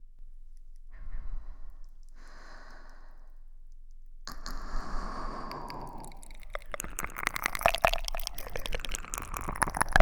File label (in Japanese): 分身エコー舐めコース